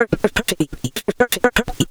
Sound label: voc 125, vocal-fx, vocal house; PS-28 Vocal House Samples